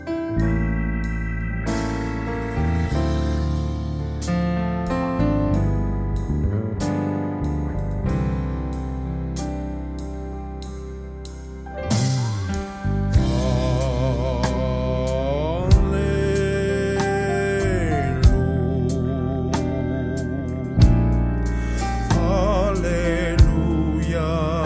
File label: Allelujah, Gospel Vocalises & Warm ups